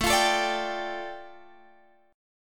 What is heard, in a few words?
A 7th Suspended 4th Sharp 5th A